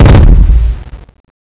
megalodon attack bomb; Boss Megalodon

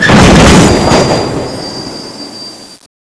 elevator fall; zs rendezvous

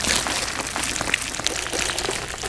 bomb run; Boss Megalodon

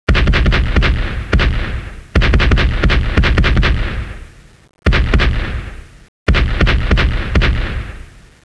warship artillery; zs rendezvous

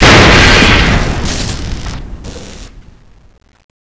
explosion panel; zs rendezvous

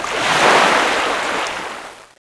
megalodon run end; Boss Megalodon

